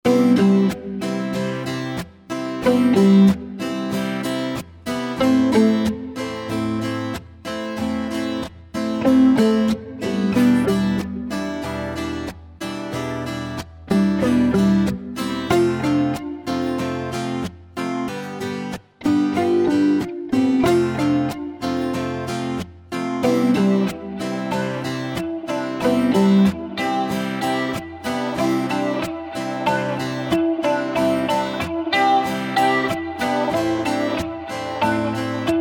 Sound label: acoustic